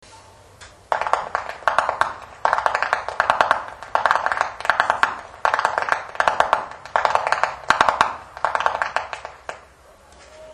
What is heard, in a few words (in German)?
schnalzen, flachgau; anthering